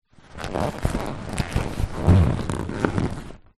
leisure lilo or rubber ring inflated body movements squeaks creaks; zapsplat